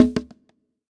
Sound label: Perc